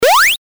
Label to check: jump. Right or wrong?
Right